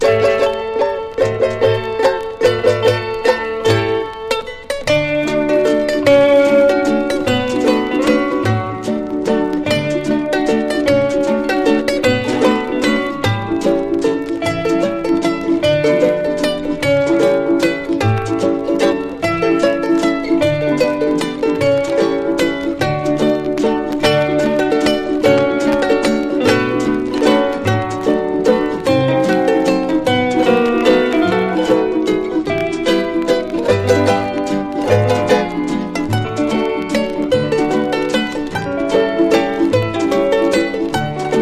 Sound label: music box waltz; COLUMBIA